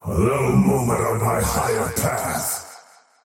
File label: teleport pain; dota; faceless void